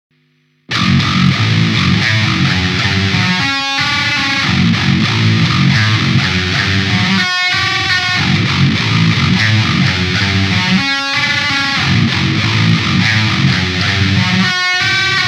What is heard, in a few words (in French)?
RIFF EN F; Damien Elite